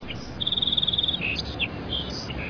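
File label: song sparrow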